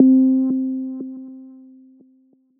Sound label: SYN Softie